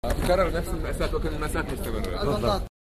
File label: loop